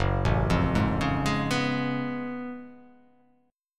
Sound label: F sharp Minor Major 11th